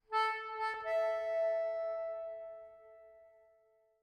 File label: Shake Stinger; Scrap